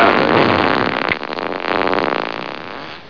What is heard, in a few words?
long fart